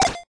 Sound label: Commodore 64 SID, 8bit SID Drums; LO FI and 8 BIT KITS